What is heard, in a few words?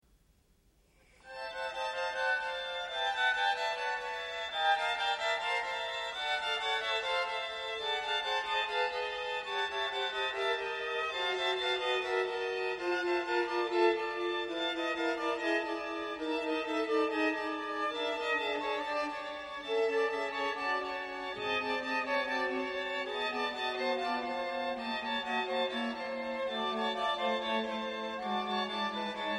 String Quartet